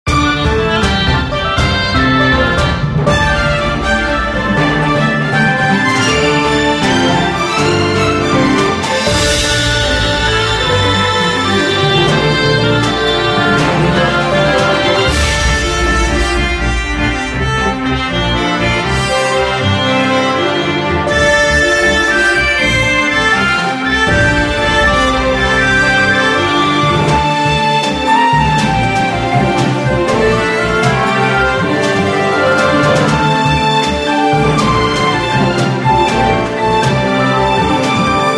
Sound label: Battle BGM